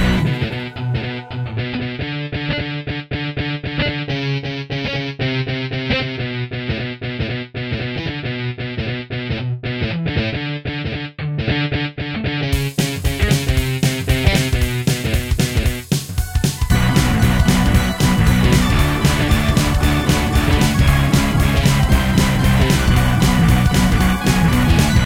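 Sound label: karaoke example no guitar; DELTARUNE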